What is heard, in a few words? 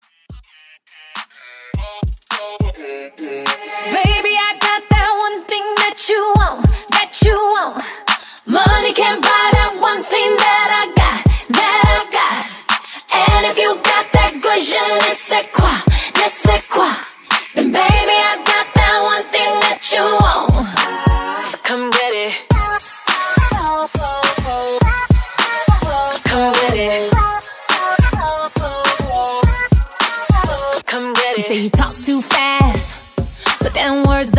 Top40s Pop Re Drum 104 BPM